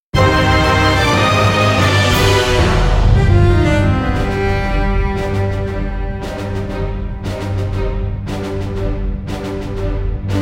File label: bgm roll; Deadstorm Pirates; PS3